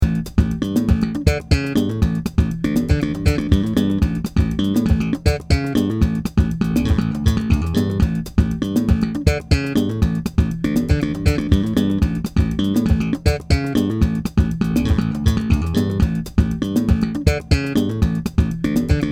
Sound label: ashdown mk500 slap